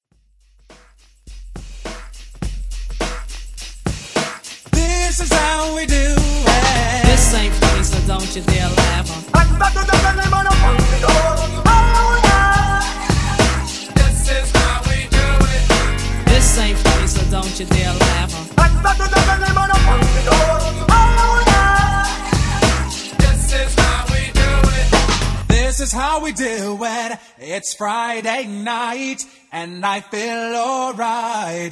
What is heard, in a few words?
Acapella Drop Hype